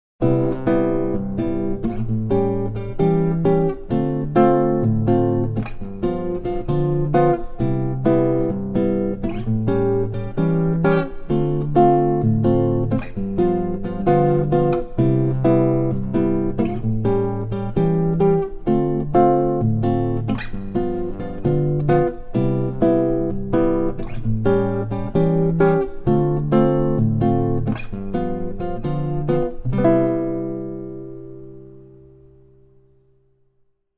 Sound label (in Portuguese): Bossa Nova Turnaround progressao